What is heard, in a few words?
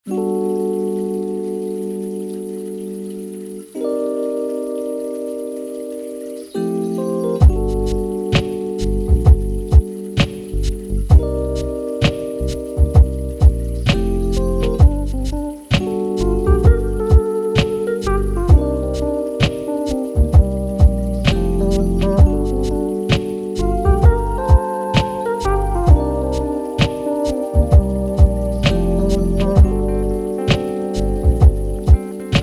chill, lofi